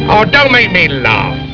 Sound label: gung laugh; gunga